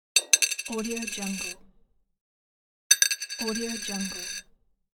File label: coin falling